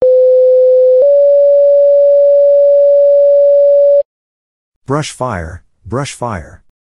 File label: brush fire